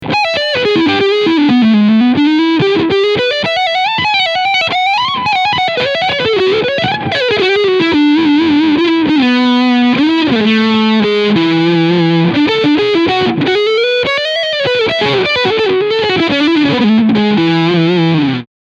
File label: Leadsound Front PU